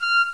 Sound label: pipe